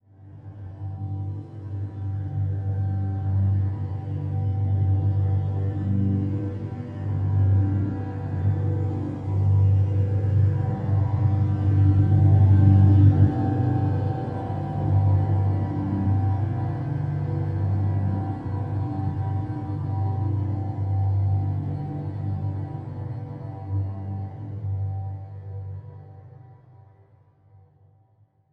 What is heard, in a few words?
Title Transition Stab Dark Long; Production Element